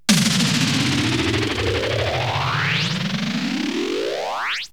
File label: WHOOSHES; AMG Megabass REMIX! VOL-2